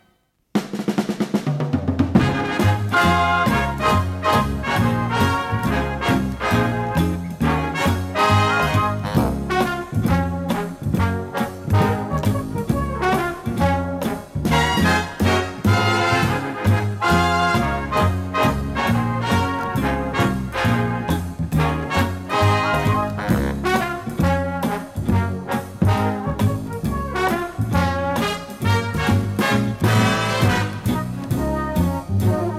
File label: Unknown Instrumental